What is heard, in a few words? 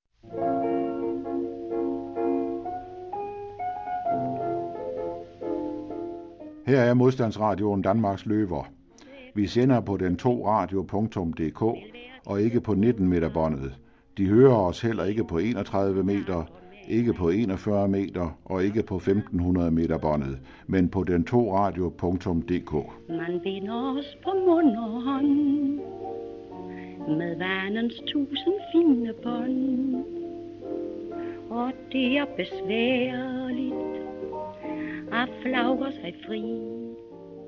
jingle; modstandsradioen